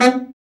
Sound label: BRA BARI, Bariton Sax